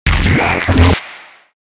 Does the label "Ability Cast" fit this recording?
Yes